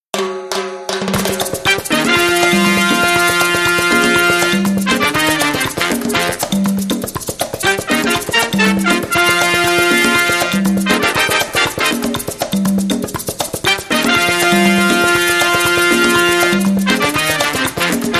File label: Big Band Mambo Horns & Drums